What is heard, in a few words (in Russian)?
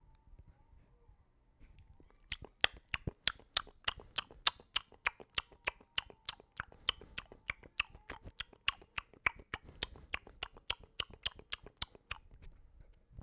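zvuk chavkaniya, sound of chomping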